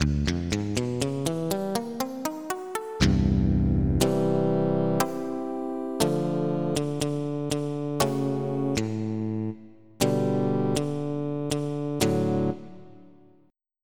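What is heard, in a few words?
Muted Guitar; FF7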